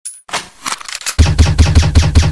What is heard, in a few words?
silenced gun shots